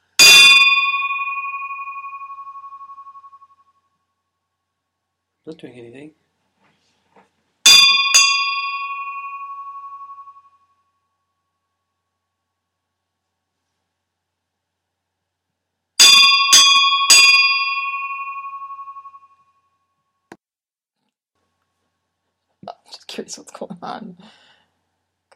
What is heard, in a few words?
Second Recording of Bell